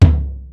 thump

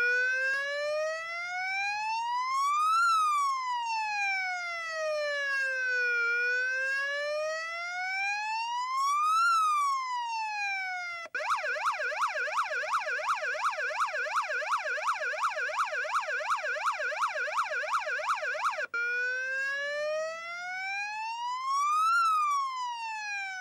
Siren

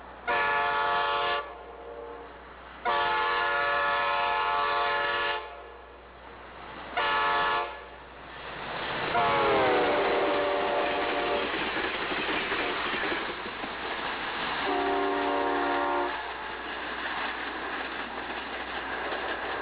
Doppler

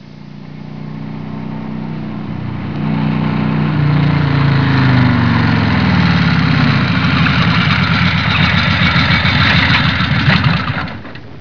vehicle

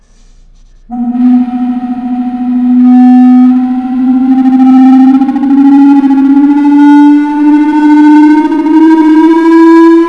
Up Whistle

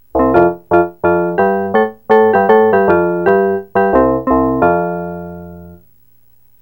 Old Gray Mare

